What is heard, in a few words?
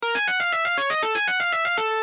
singing